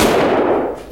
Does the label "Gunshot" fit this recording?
Yes